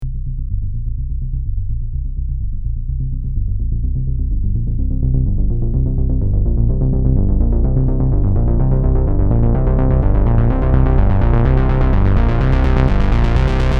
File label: basses